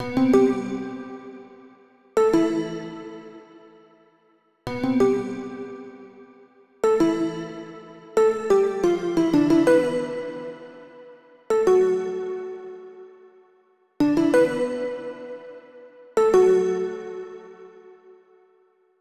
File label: Pluck Melody; super crush k.o.